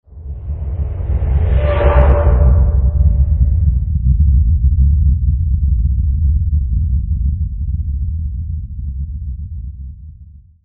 Rumble; ns training

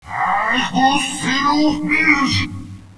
attack, troll

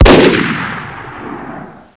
sniper rifle shoot

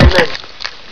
bust flesh

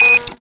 double, satchel; Zshiryu777

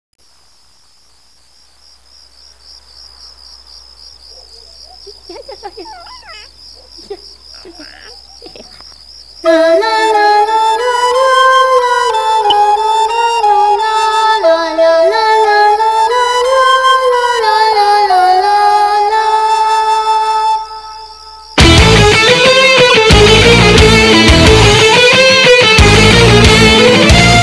music

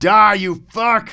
fight; ragemap2025; fournines

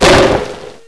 flashbang

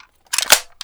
crossbow clip in, weapons

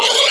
pig pain

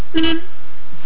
moped honk

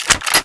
m16 slide

